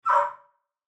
Dog Mutt Bark Whiny; Animal; Dogs; Blastwave

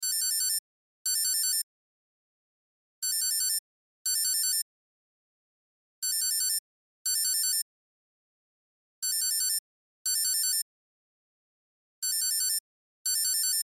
Cell Alarm Chime Loop; Alarms; Electronics; Blastwave

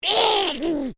Created Creature Small Call, Alien Sound Effects

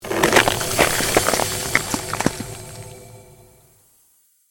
CREATED MAGIC SPELL FREEZING TOUCH

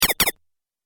Double Laser Shot; Double Laser Shot 01; Laser Gun Sound Effects; Sci-Fi Laser Sound Effect; Andrew Fleming

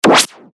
Glitch Record Scratch, Glitch Low Warble Squelch; Production Element Imaging Element Accent Transition; Blastwave

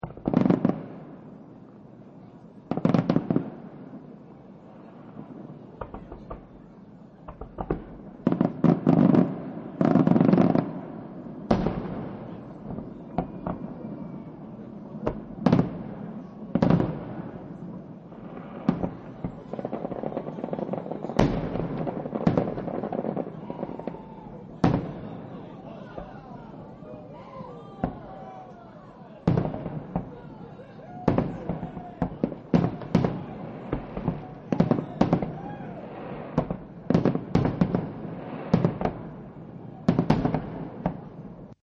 Fireworks Small Crowd; Chinese New Year

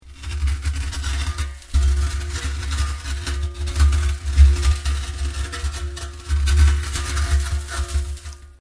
POT CLAY LARGE ROTATING; Material components for constructing more complex sounds; Machinery Sound Effects

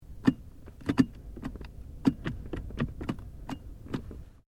Car Ford Focus Gear Change When Off; Ford Focus hire car; Cars; Vehicles

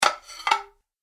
Large Dinner Plates Slide Across Each Other; Household; Dishware; Andrew Fleming

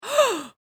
Human Female Gasp; Gasping; Voice

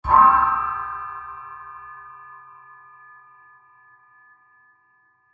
Screeching Metal Impact; Miscellaneous Impact Sound Effects; Miscellaneous Impact (Sound Effects:Impacts)

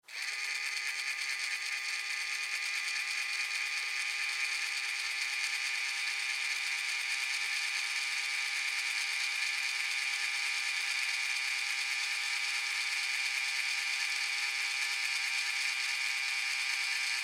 Toy Fairy Wand Med Far Run Long; Miscellaneous Toy; Sound Effects:Toys; Big Room Sound

